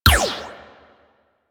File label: Shot; Warframe; Tenno AR; Prime Tenno AR